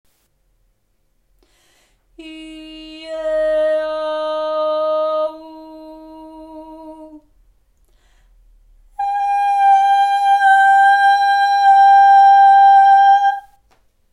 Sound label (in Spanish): Vocales en agudos